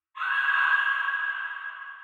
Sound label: Echo Monster